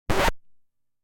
Record Player, Needle, Vinyl, Scratch, Abrupt, Hasty